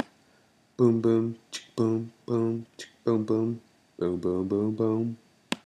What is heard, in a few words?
boom chick